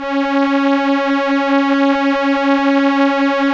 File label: STR ELKA Cellos, Elka Strings